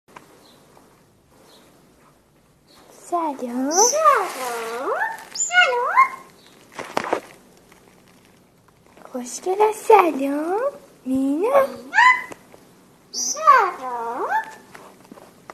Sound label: myna say to hi